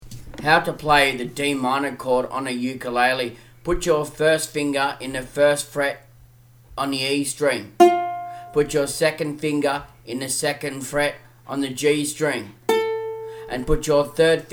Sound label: D minor chord for the ukulele